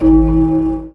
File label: countdown